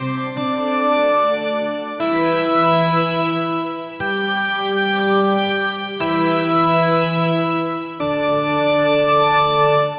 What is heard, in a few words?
general midi, organ; flex plugin